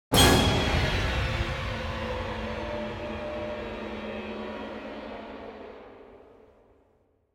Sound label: Cinematic Music - Horror Stinger